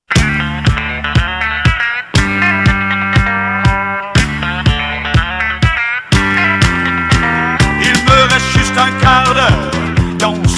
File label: country music